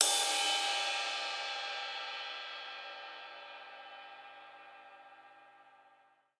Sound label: drums ride; finalplug